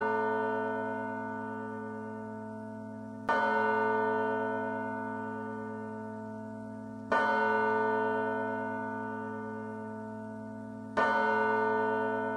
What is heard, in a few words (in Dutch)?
Kerkklokken